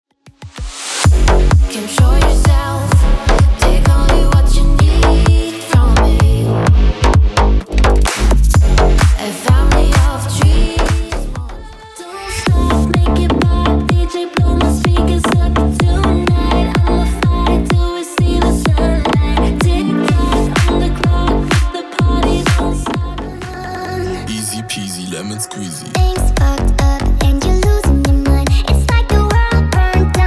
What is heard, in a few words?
slaphouse